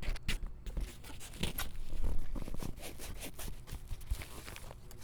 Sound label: Jostle Books; BB Retail Therapy Sample Pack